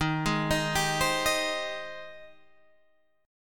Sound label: G sharp Major 7th D sharp